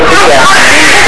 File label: cry